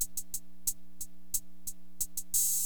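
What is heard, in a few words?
Hi-hat loop, HipHop; LiveAct Pool 1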